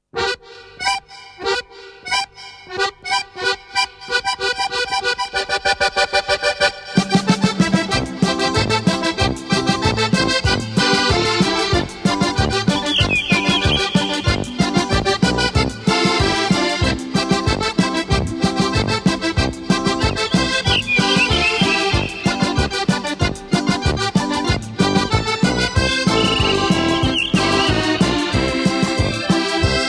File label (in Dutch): Electronica's